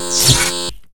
buzzer

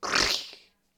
gator exclaim

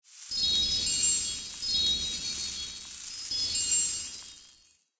heal pixie dust

